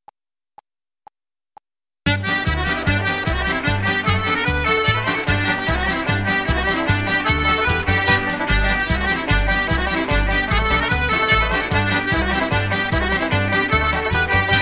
Traditional Irish Fast Polka G

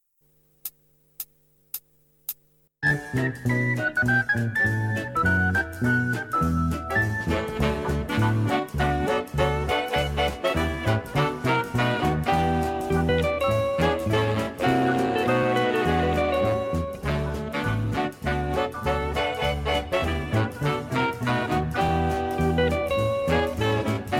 Ballroom 40s Quickstep, D; backing track

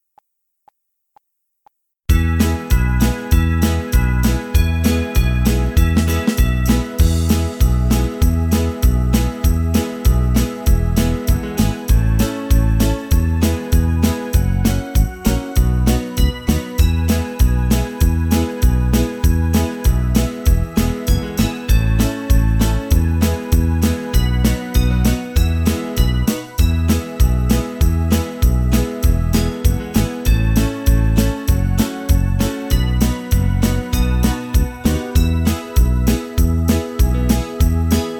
Christmas Medley, mp3 backing track

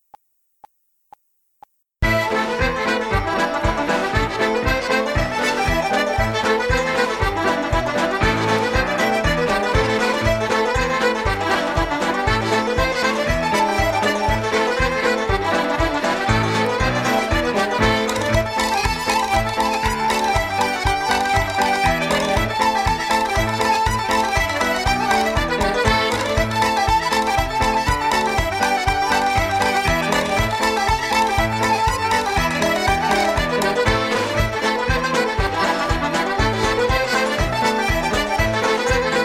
Irish Ceili Reels Medley; irish traditional

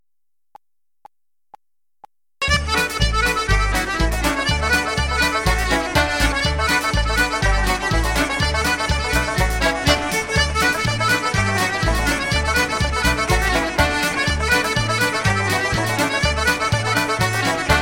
Traditional Irish Reel D; backing track

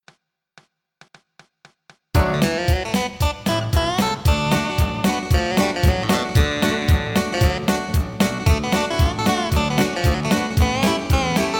bluegrass pickin short guitar solo

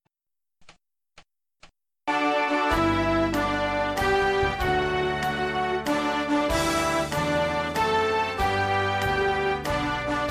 Happy Birthday No voices G; Party Song

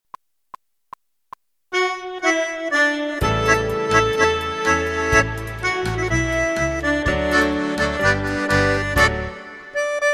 Accordion Tango Medley D; backing track

